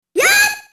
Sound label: attack; snes; smas; pluto